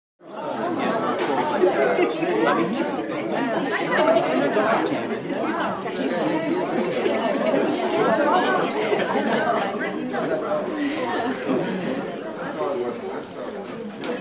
Large Interior Crowd Loud Murmur Close to Distant Persp